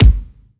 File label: kick oneshot, Pop; outcast; Slate; SD PASADENA Pop Sample Pack